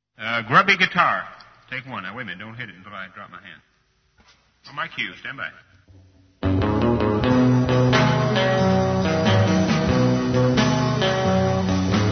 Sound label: grubby guitar